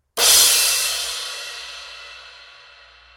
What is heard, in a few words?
18in Custom EFX